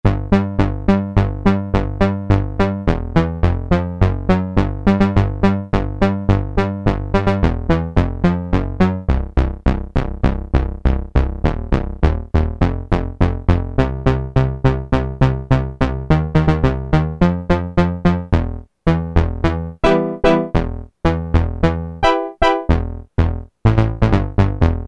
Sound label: Synthe Bass, Korg Poly-800